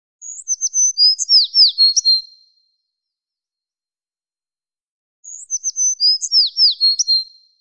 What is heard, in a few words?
Birdcall